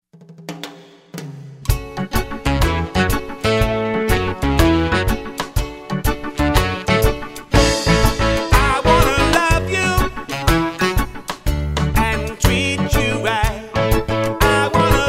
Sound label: dance band